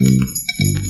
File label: bass cowbell, Loops, Phonky